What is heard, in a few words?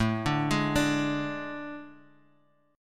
A Major 7th Suspended 4th, Guitar, Open F; AM7sus4 arpeggiated; 9,9,8,9,x,x